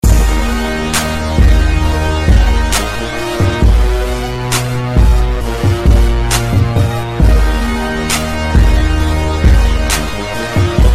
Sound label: Hard Beat Rap